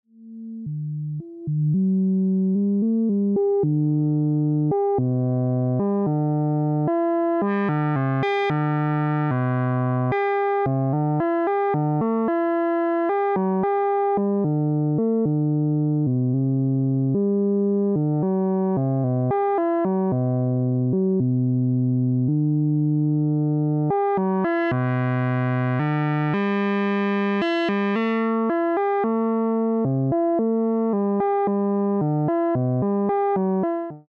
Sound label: Octabass; TTSH Patchbook